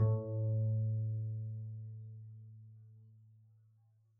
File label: pizz a2, double bass